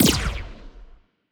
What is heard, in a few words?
wpn evasion buff muzzle; biogenesis